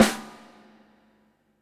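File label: FX snare drum, Drums Acoustic; FX KIT; USB Soundscan vol.10; AKAI; 90s sample CD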